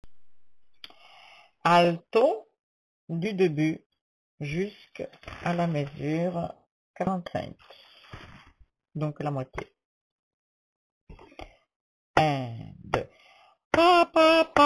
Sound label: alto lullaby